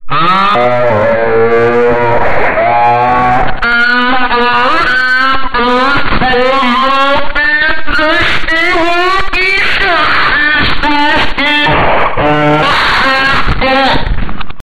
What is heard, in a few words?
honk